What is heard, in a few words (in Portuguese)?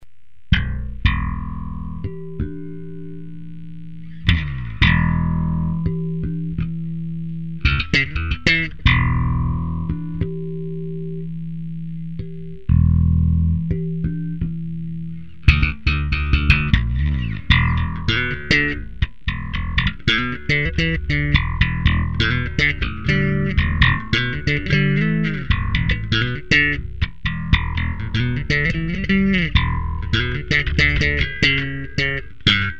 improvisação slap